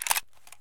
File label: rifle pickup; Escape from Tarkov